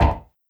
Oneshot

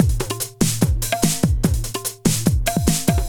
LOOP, Eurotech; Sounds Good

